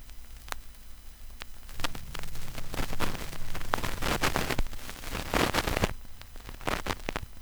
vinyl crackle; 99Sounds; Vinyl Noise SFX

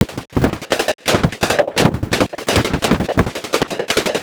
Loop - 170 bpm, Glitch Noise; 99Sounds; Mangling Audio

